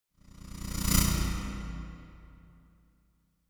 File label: Whoosh Stutter Fast Pulsate Fear Pass; Hollywood Edge; sound designer's toolkit v2